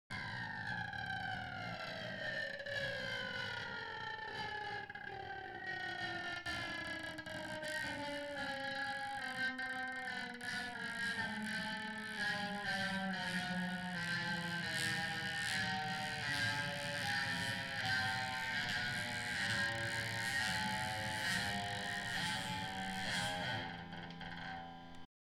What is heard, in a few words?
Horror Sound